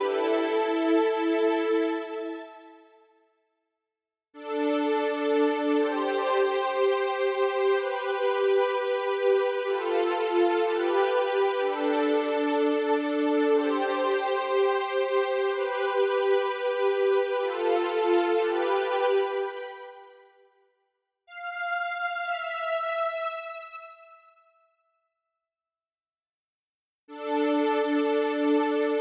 EQ synth; TubeTech

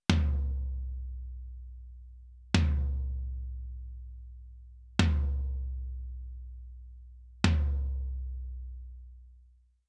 Daking Tom Fet III

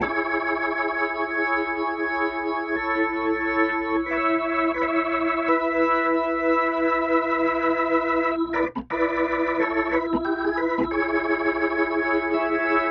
Organ Chorus

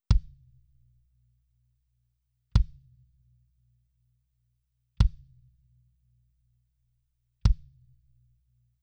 Kick Fet II Compex; Daking

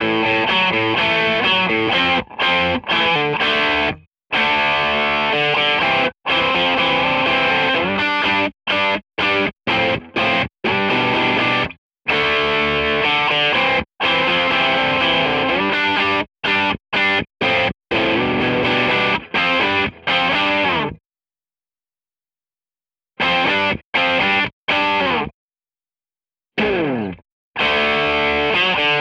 EQ Electric; TubeTech

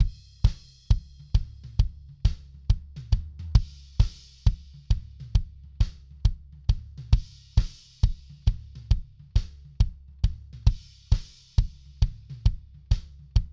EQ Kick

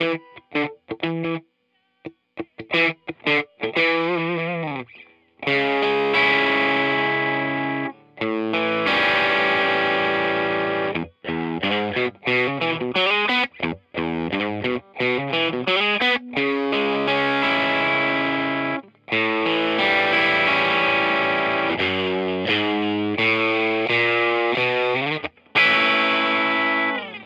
EQ Electric

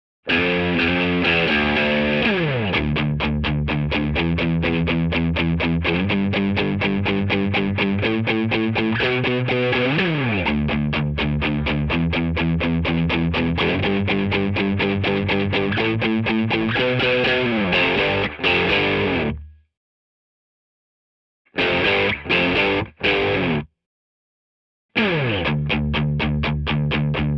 Electric; TubeTech

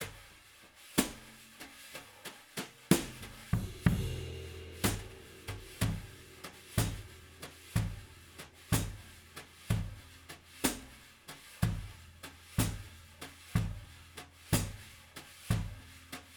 OHs; TubeTech; CL-1B

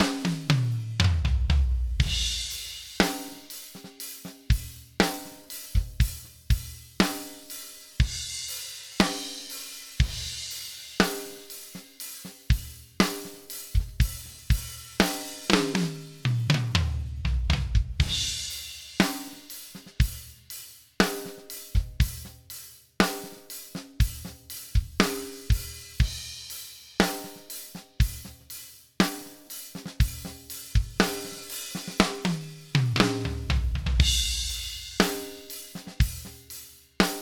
Drums Comp; Daking; Fet III

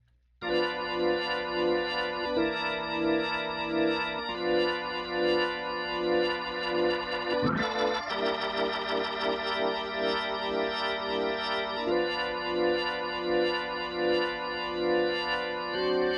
Organ

